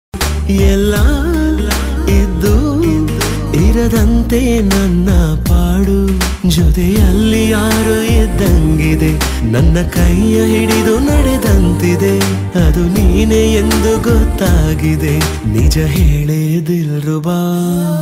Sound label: kannada movie song; romeo